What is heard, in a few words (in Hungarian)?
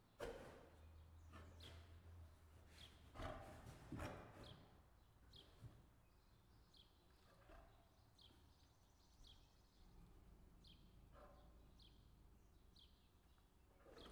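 kétszeres volt, a rácstól a mikrofon egy méterre, bengáli tigris; kecskeméti zoo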